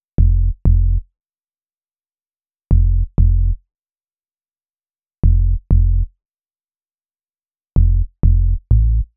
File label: HipHop Synthbass Fmin; Digidesign; Pro Tools Essential Loops